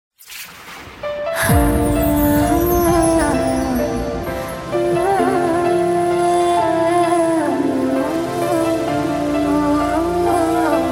soul beat ringtone

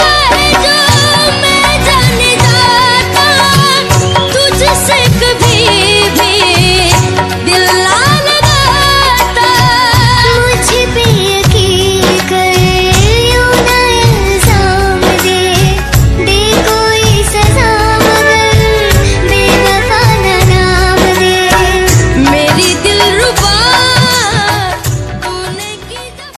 hindi ringtone

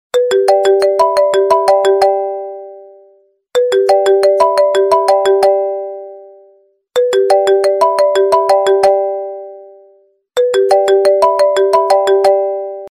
iphone ringtone

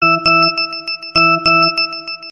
Nokia original SMS ringtone

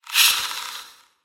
sms notification ringtone; caffeine snake; galaxy

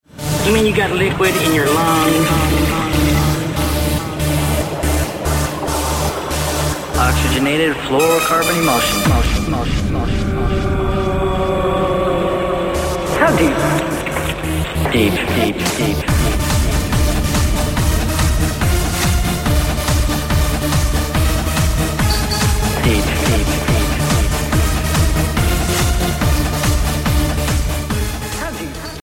deep sea liquid mix ringtone; english ringtones

